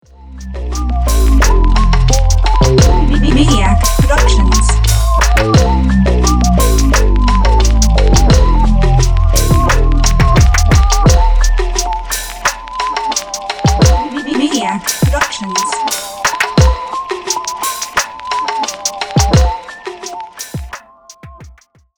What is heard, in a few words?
Retro Keys